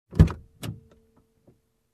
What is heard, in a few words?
Opening glovebox